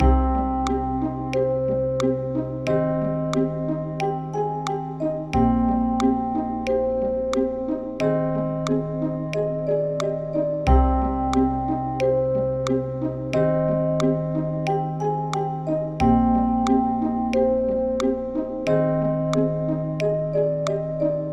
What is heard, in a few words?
Sad Loop